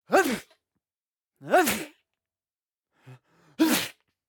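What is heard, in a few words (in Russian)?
мужчина чихает сквозь руку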